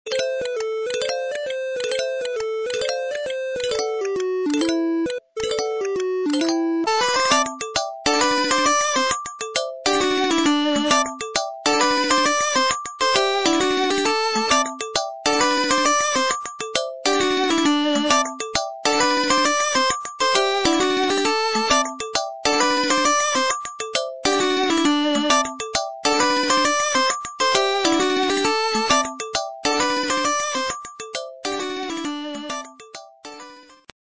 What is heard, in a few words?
Game Over; Kamiya Studio